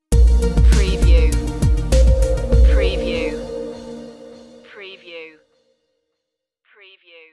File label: space age stinger